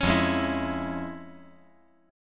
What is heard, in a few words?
F Minor Major 7th Sharp 5th, F; 6,5,4,7; 5G,4C,5E,5A